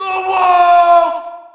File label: Shout, audience; UFC Tapout 2